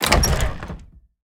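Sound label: wood double light open, door; skyrim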